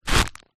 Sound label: rope stress tighten creak fast